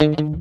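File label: GUITAR RIFF; Hip Hop; E-MU Formula 4000 Series Vol. 1; Hip Hop Nation; Phatt Planet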